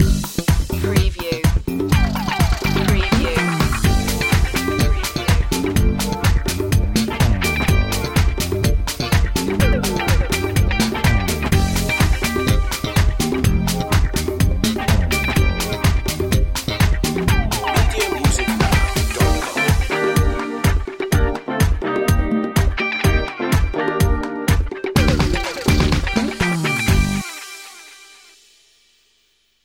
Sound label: disco balls